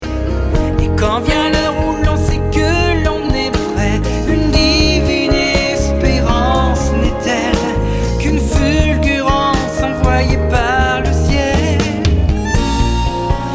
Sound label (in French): Au hasard d'un piano